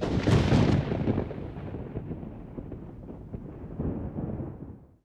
Electric; bullet

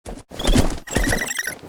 THORNASH WAR ATTACK

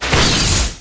Damage; Kwelersokum; Mob

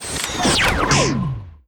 guardtower takeup

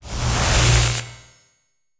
launcher

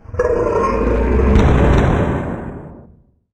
Die; Dagon; Mob

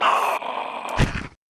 YOUNG FLEM WAR DIE

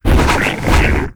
WAR ATTACK, Mob

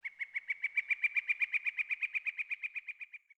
Bird, ambience

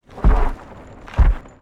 PEACE WALK, Mob; TWEEZER

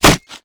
Spear Shell, Damage

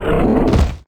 Die

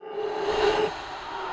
peace walk

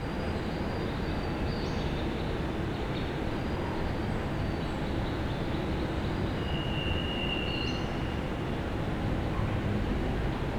forest, ambience

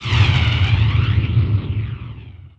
stone curse

